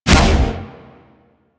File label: roulette jam burst; Warframe; Cowgirl